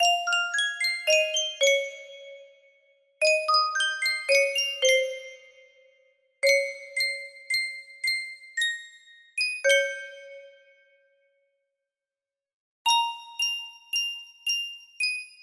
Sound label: sankyo custom tune music box, music box melody; final fantasy x